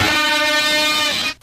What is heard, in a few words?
car horn